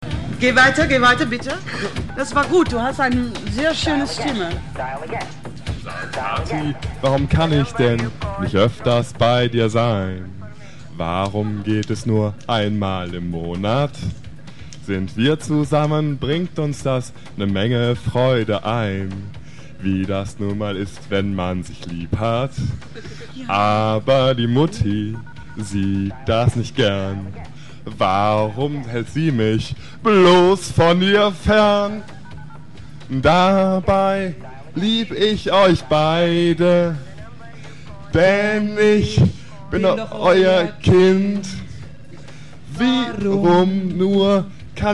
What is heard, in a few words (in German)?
robo singt schlager live; ausschnitte